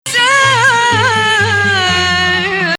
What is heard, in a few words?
Nahawand